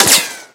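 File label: ricochet, weapons impact; Call of Duty Modern Warfare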